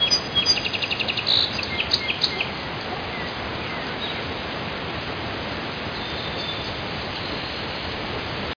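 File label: outdoor ambience; Microsoft Graphics Studio Greetings; Microsoft 1998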